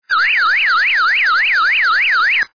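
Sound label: alarm single tone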